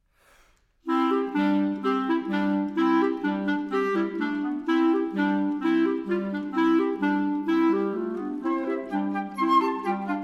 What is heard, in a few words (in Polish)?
Taniec na flet i 2 klarnety